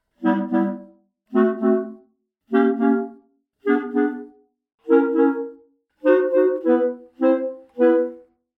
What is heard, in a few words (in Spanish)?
clarinetes